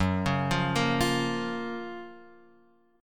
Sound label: F sharp Major 7th, Guitar, Open F; arpeggiated; JGuitar